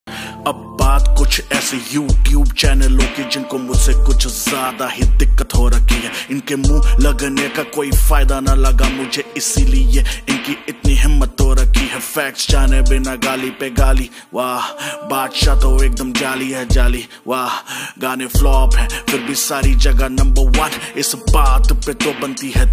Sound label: hindi rap ringtone